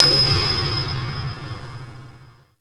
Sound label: metal hit; Greytsounds; F2 TRAX 4